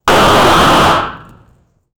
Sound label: 16-bit sound of explosion